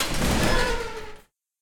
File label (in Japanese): open metal